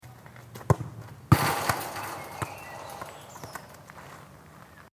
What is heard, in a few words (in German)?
Spielplatz Fussball